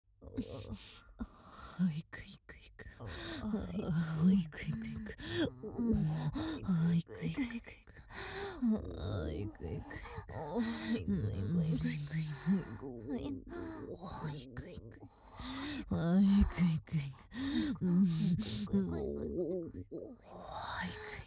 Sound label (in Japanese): 低音喘ぎイクイク連呼; OL×痴女